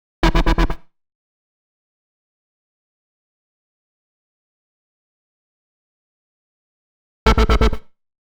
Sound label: 128 Am Bass, Complex Electro Synths; Prime Loops; Speakerbox